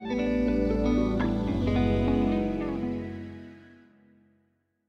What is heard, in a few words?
Completed; Rocksmith